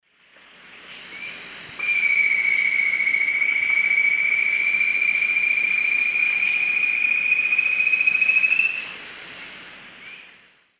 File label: Formicarius colma, song; S. America; Eastern Lowlands